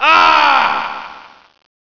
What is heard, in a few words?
Uhh; NS-SHAFT 1.2